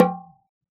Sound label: oxaca, percussion; ILIO; Synclavier Percussion 2; World & Orchestral